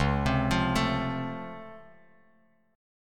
C sharp Augmented Major 7th down Bass Standard, chord; C#+M7; arpeggiated; JGuitar